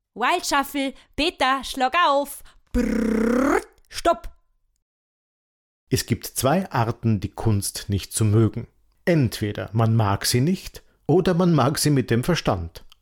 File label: wild shuffle string